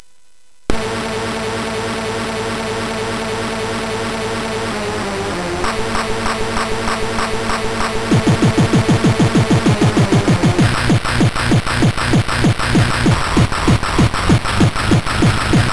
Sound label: Hardrave, MOS6581R4